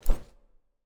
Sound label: Object Pick Up